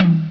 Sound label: HIGH MID TOM; Dark Forces Demo; Dark Forces Instruments